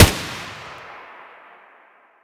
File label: aug muzzle mono distant, weapons; STALKER; IWP